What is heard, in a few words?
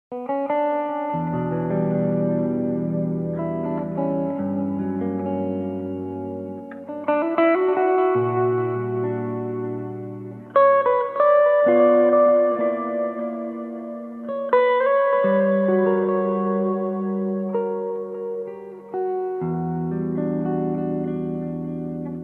solo